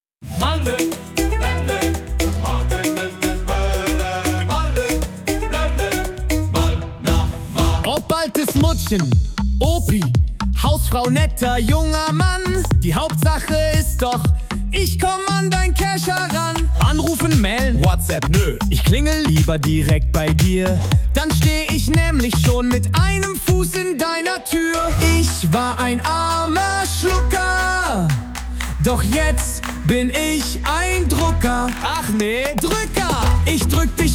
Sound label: Flamenco; Druecker Party